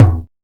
tom drum one shot e key, Royality free tom single hit tuned to the E note. Loudest frequency: 224Hz; tom drum samples